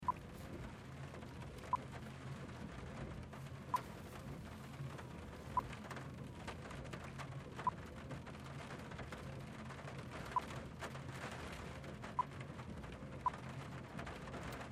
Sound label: Peugeot 106 Int Drive Rain